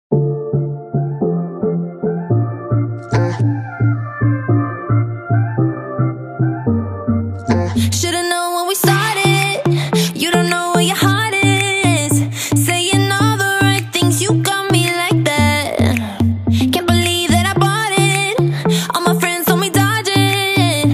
electro ringtone

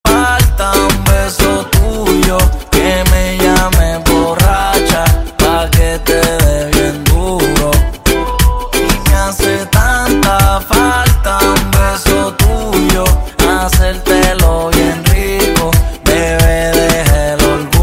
hip hop ringtone

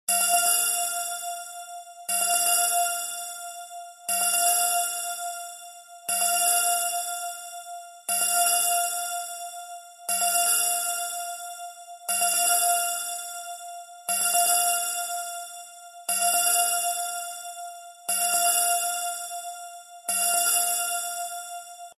iphone urgent bells ringtone

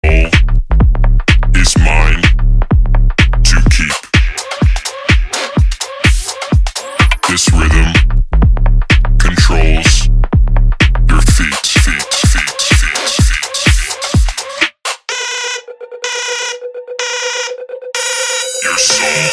electro soul ringtone

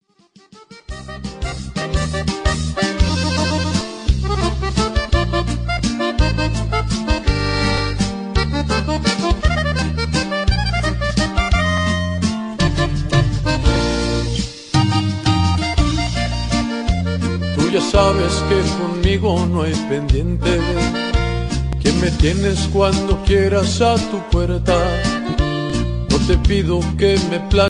ringtone

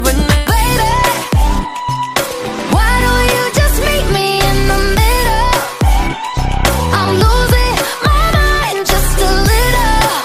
electro ringtone

